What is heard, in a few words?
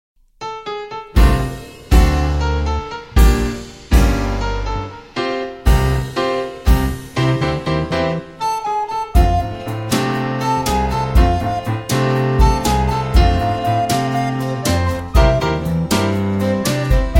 Instrumental